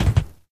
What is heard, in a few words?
speed roll end